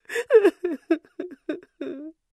crying, Human Female

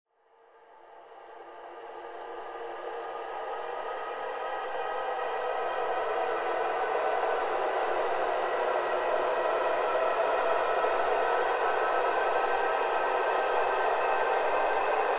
scary; la creatura; OldThink

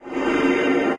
curse

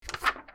use, scroll

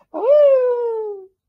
awoo man; Voice Awo

